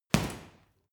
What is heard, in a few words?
boxing punching bag hit hard; boxen